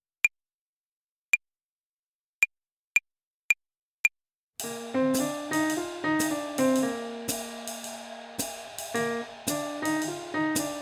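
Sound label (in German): Swing Phrasierung Übung; play along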